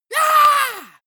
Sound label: monster growl attack high; schreie